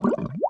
menu bubbles

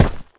dirt run

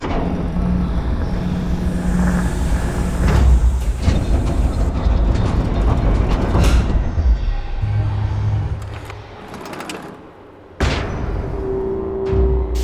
cutscene sfx

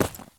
sprint slate; Escape from Tarkov

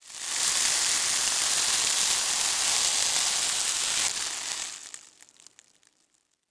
sand shot; World of Tanks Blitz

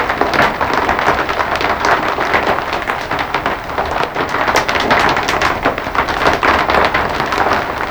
rain roof

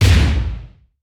damage hits explosions

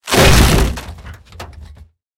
tree break

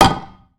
tank hit; sfx tanks

